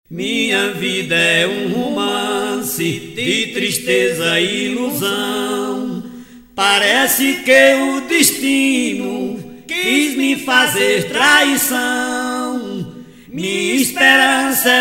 canção do lenço toada, aboiador

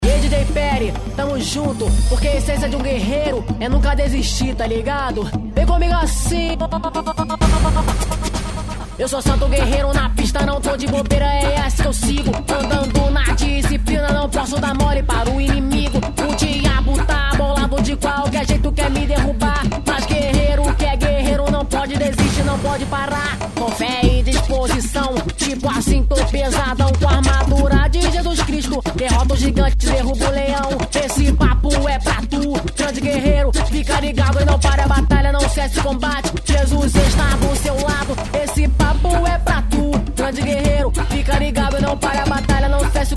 gospel funk

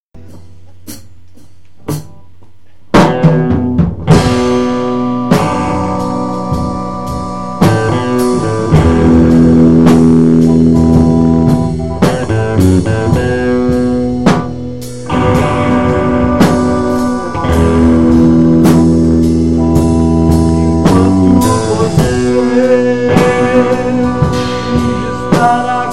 acordes

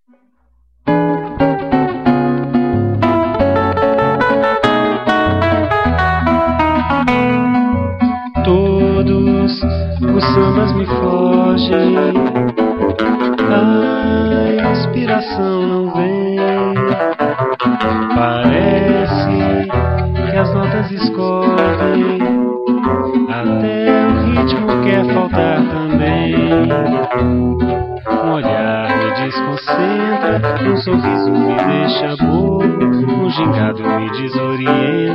samba

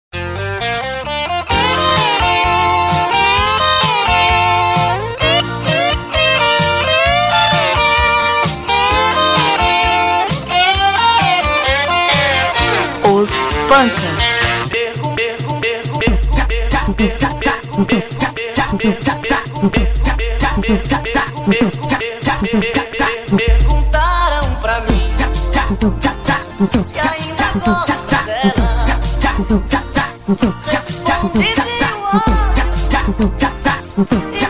funk session

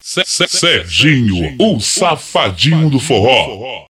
vinheta

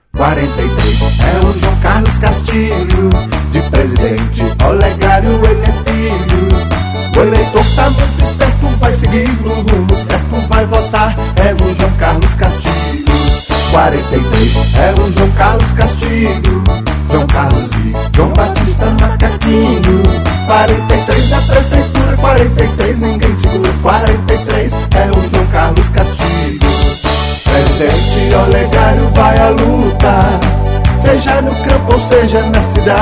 jingle forro

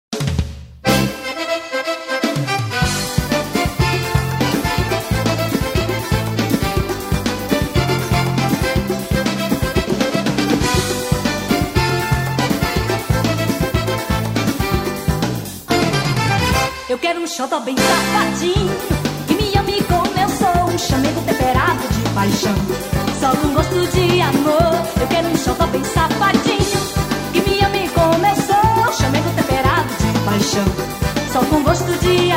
forró safadinho

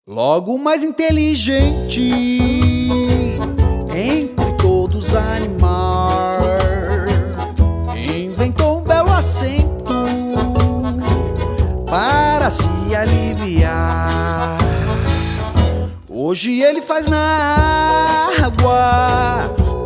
xote

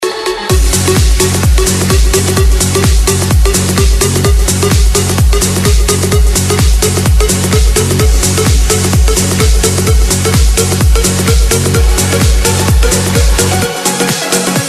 trilhas dance

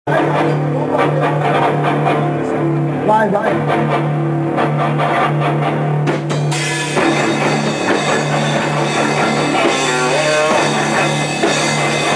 intro ensaio

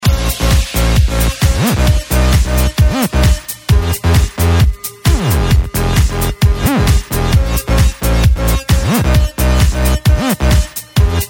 trilhas dance

